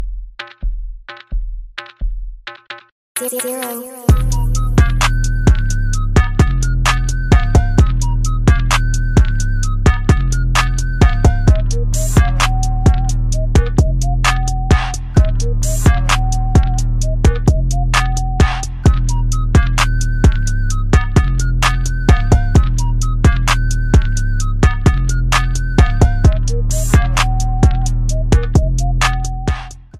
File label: instrumental